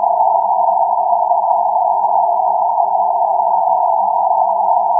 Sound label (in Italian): rumore armonico